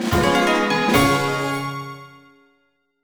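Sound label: Fanfare Get Rail Map, gameplay sounds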